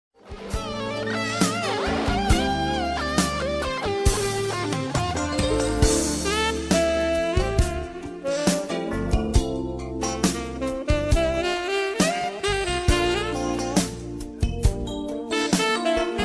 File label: jazz smooth